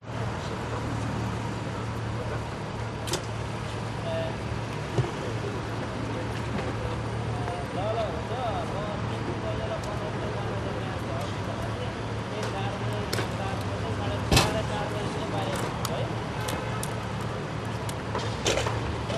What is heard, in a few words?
airport outside pick up area ambience car doors cars pass birds early morning; hamad int qatar